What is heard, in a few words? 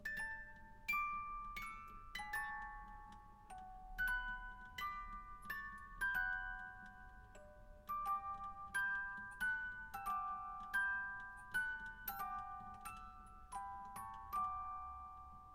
Mazurka; Chopin